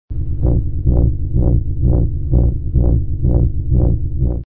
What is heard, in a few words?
arrow zapper sound